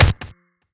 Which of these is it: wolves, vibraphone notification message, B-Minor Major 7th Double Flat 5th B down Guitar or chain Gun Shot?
chain Gun Shot